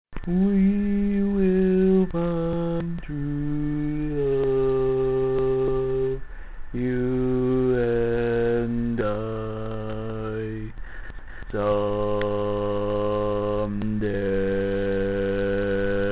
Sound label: Bass